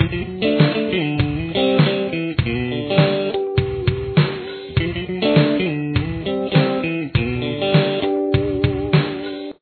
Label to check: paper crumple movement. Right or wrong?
Wrong